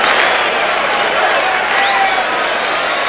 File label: excited